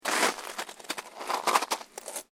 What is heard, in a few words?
SNOW CREAK; Material components for constructing more complex sounds; Snow (Sound Effects:Weather)